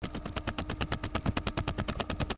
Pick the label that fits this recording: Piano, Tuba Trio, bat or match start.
bat